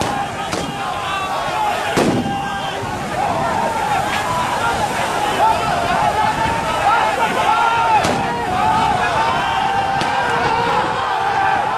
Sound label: Bahrain police shooting a protester directly in the face from short distance